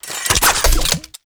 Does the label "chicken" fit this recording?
No